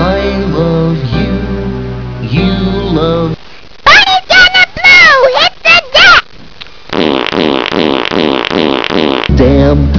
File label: brny fart